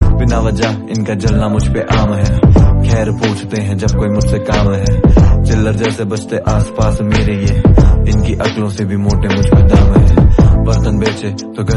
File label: hindi rap